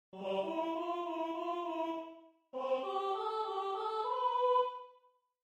soprano

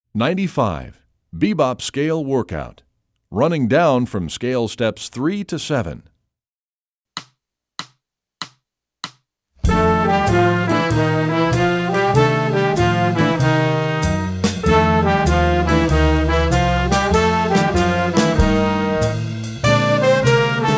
bebop scale workout

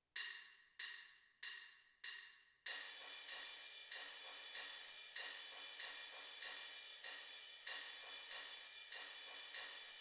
suspended cymbal tambourine

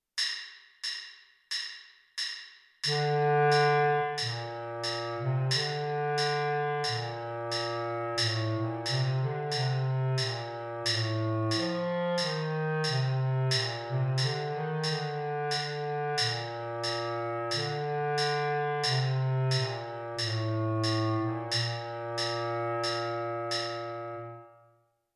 bass clarinet part b; the conquering hero

